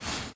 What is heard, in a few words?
Shoji Open